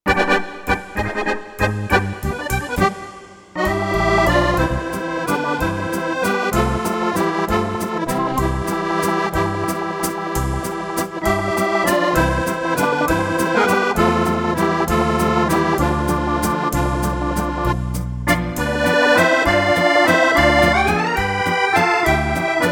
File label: Retro Organ